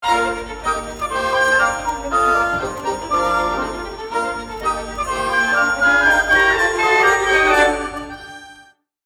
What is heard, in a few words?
Overture, 16th note counterline in the violins; Rule of Three; The Nutcracker; triangle